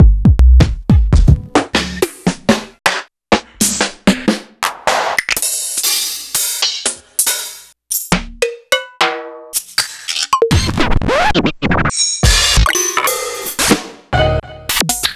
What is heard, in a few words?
Kit; proteusvx